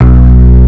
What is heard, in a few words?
bass; osu